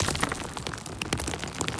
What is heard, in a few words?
rock start; Illusion UE5